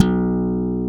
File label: POP B1, bass; AKAI S6000 CD-ROM - Ultimate